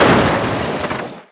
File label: Ground Crash; SuperBike2001